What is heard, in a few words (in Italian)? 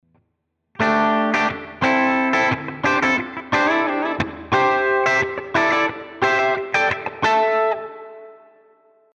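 Esercizio, ARMONIZZARE la pentatonica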